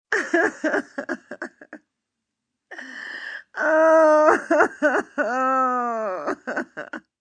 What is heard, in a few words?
Cry Hopeless F, Cry, female, hopeless, pain, hurt, sad; human voice; Crying